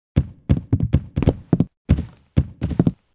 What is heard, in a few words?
duel intro sfx; Sid Meiers Pirates; XBOX